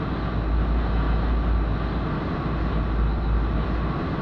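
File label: Engine Drone Interior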